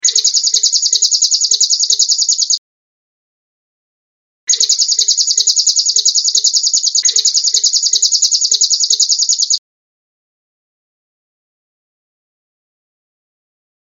serindit

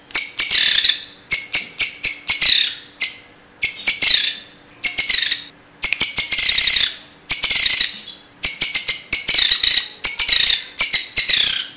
caladi ulam

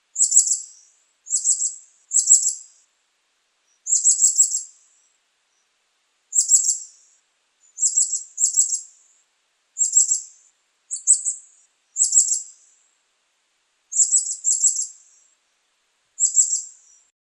serindig srilanka

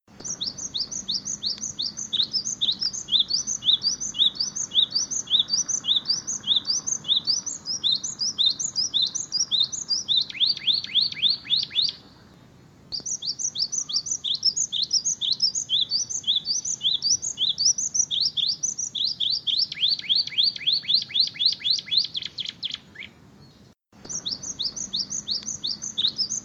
kenari isian gelatik batu

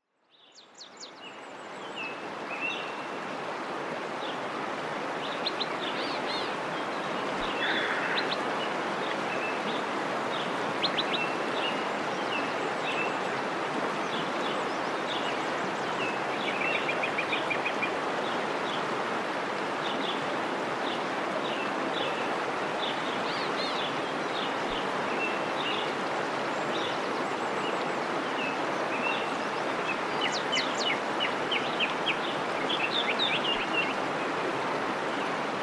terapi kicauan dan air terjun

